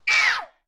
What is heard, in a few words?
harpie hit; enemies